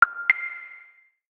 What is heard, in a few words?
Droplets; OnePlus; OnePlus 11